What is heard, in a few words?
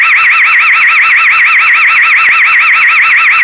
alarm